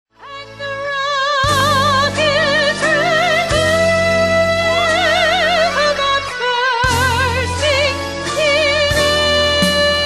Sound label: National Anthem